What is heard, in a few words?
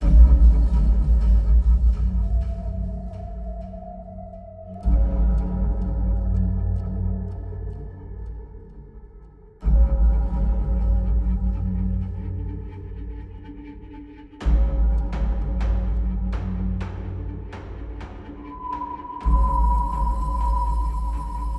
Bear Chase Loop; Never Alone